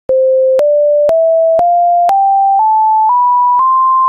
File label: doremi just; musical scale